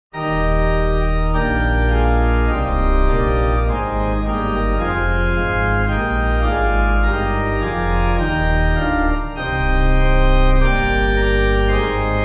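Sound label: Pipe, pipe organ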